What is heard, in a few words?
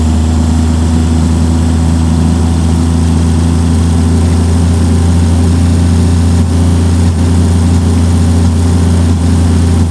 fourth cruise, bus; tdmcars